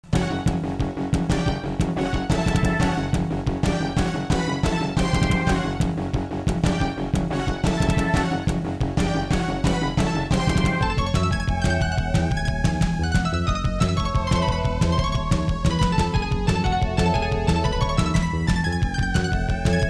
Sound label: bgm, MIDI BLASTER "Midi pac + Fm stereo pack"; MIDI PAC décroche à 0:32 (canal gauche FM PAC canal droit MIDI PAC)